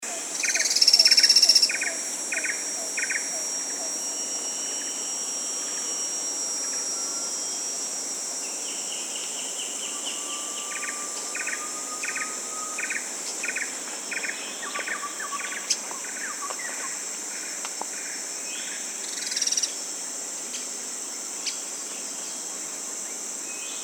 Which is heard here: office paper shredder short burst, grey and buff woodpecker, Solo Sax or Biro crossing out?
grey and buff woodpecker